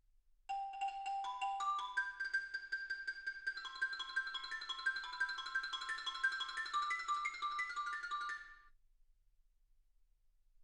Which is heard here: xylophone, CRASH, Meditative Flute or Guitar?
xylophone